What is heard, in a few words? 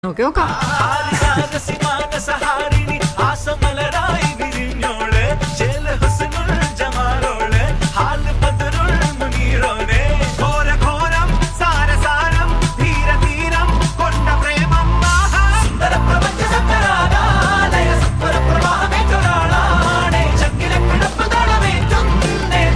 ole melody, ringtone